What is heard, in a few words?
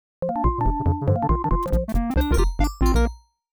Stage Start; Férias Frustradas do Pica-Pau; Genesis